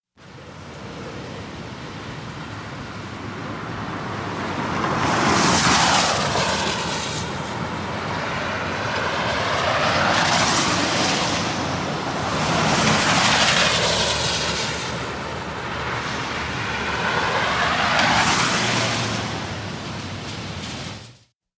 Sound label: Wet Traffic Wet Road